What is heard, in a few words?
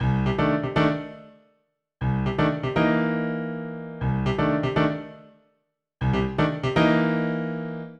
120 NuJazz Piano Cmaj, Grand Piano; Digidesign; Pro Tools Essential Loops